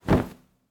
Clothes Drop

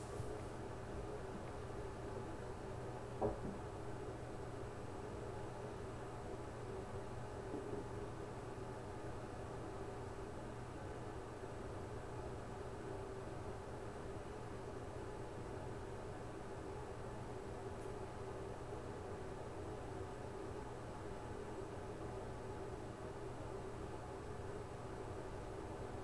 Dorm Roomtone

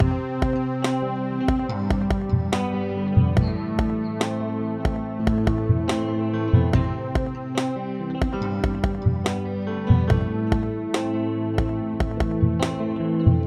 Romantic Arpeggio Loop

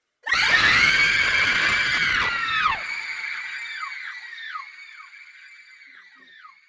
Horror Movie Scream Female

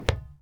Footstep Metal Crouch

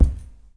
Thump

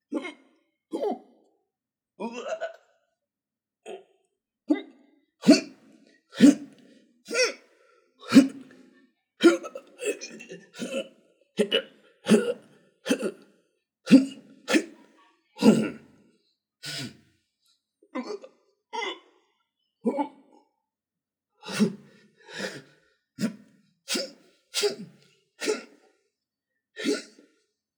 Fight Straggling

